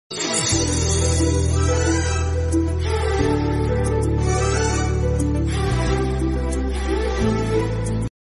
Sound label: love bgm ringtone; athadu movie; mahesh babu; trisha